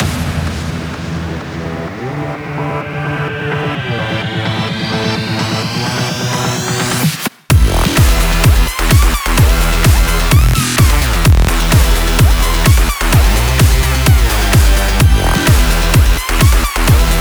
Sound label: Epic Edm Show 128bpm; clone drone in the danger zone